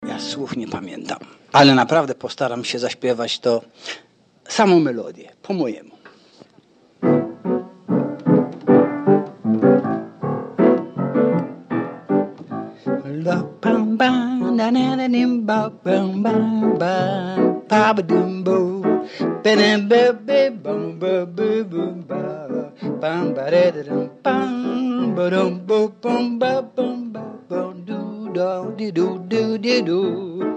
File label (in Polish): piano spiew